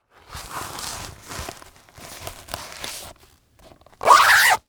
Sports Racquet Tennis Racquet Place In Cover Zip Up